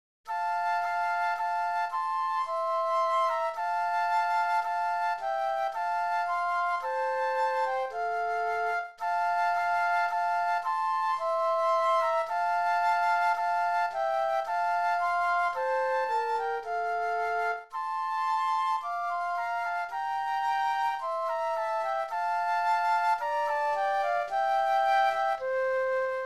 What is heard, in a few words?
Flute Duet; christmas